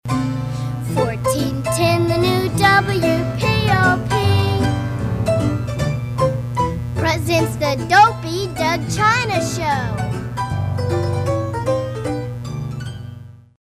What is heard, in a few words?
jingle; Doug China